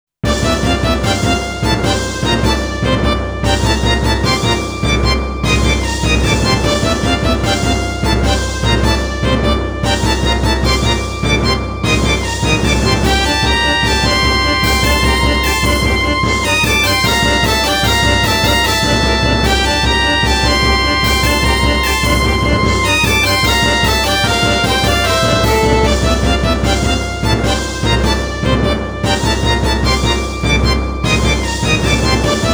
Battle, Synthesizer Version; Super Famicom Magazine Vol.10

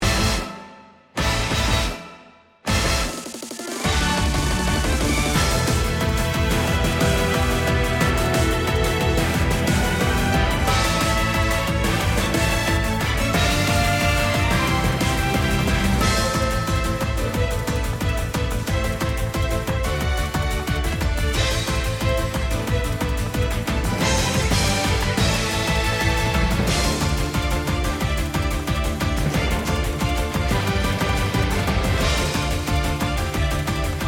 Combat; Ange Vierge Girls Battle

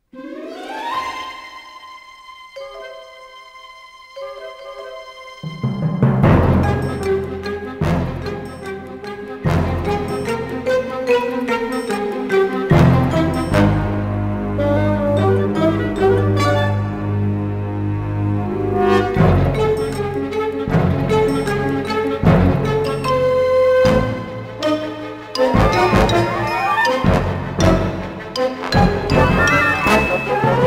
Orchestral; Family Guy Back to the Multiverse

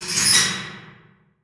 metal screech

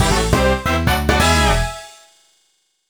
Start Fanfare; Mario Kart DS; USA; kiosk demo prototype

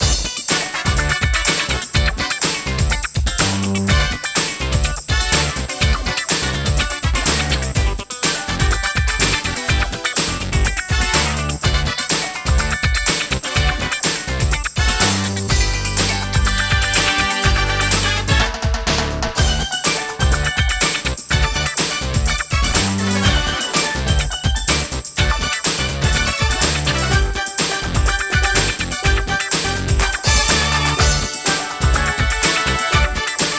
Tennis - Normal Court; Super Monkey Ball 2

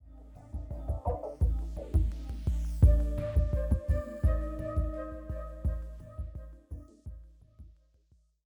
85bpm

